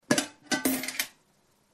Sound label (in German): Geräusch